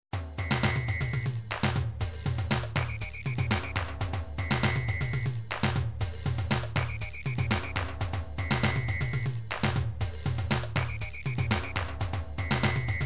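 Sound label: drums pattern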